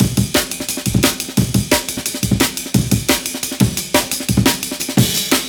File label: Amen Clean 175, classic dnb breaks